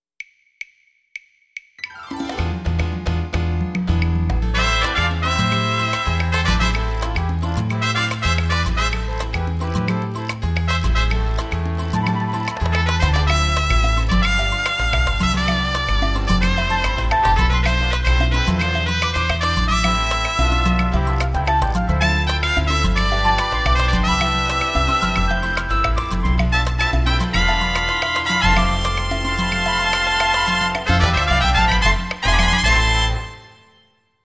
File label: salsa